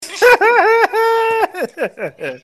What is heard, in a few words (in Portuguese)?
risada